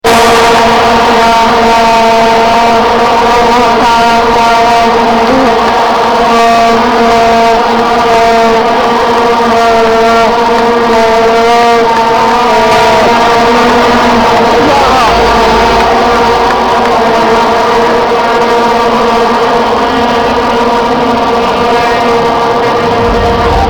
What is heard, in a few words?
vuvuzela horns